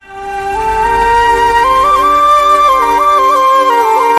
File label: new notification ringtone